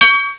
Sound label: explode